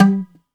BOUZ PIZZ G 1

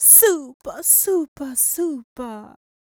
f mel d, fem Vocals

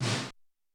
U220 FX Set, Drum Machines; Roland U220

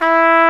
Hard Trumpet E3; Proteus 2000; Digital Sound Factory Proteus 2000

